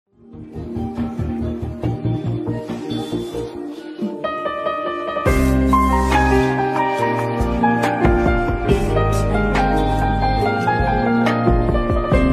instrumental ringtone

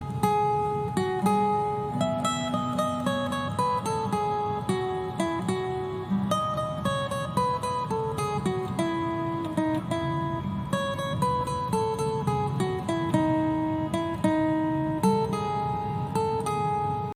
instrumental ringtone

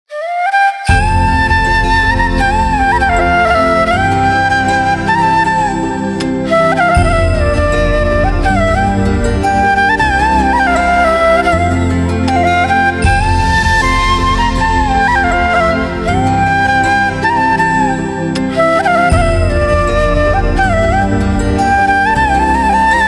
instrumental ringtone

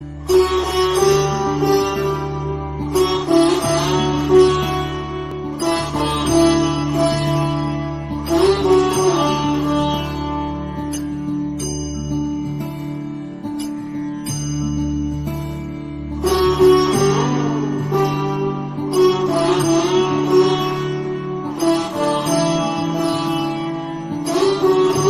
ringtone instrumental

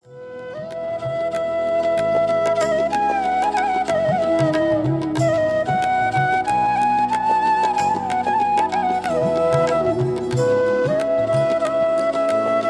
krishna flute music

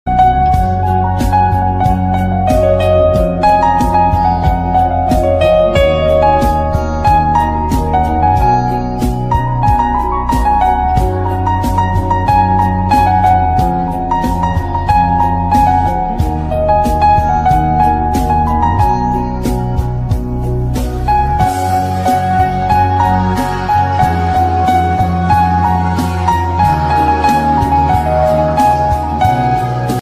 Instrumental Ringtone; do patti

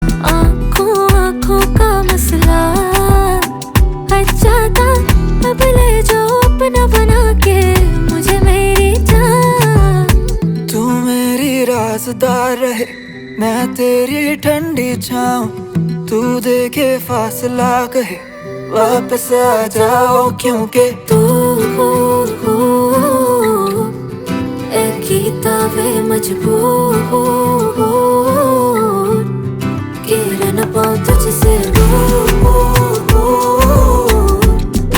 song ringtone

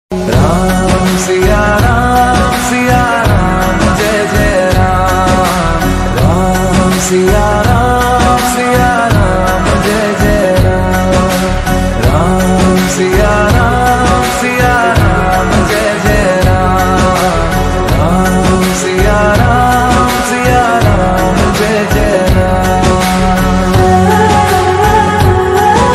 ringtone hindi

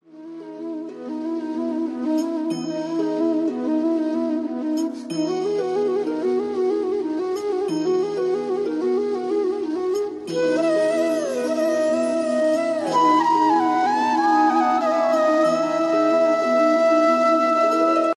Flute Ringtone; Lord Krishna

